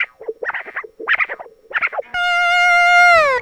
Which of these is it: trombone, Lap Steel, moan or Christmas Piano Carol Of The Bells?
Lap Steel